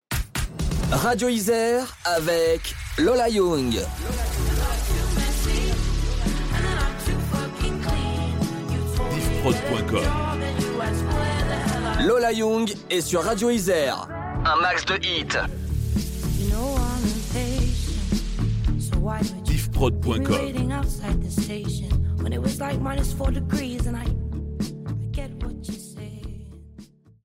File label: Radio Power Intro; Radio ISR